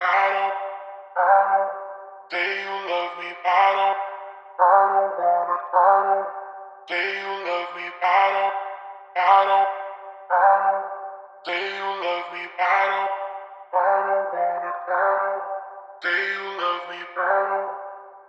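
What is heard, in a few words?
vocal chop, 105 bpm, Cm; Say You Love; Slate Digital Omnivox Vocal Sample Pack; Chops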